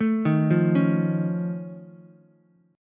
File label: F Augmented 7th F Ukulele, chord; F+7 arpeggiated; JGuitar